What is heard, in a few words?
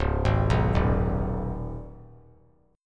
C sharp 7th Suspended 2nd C sharp down; C#7sus2; arpeggiated; JGuitar